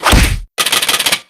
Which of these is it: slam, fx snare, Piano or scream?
slam